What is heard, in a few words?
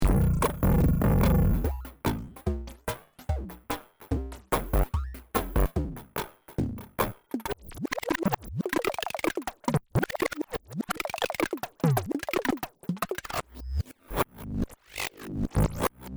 down loop square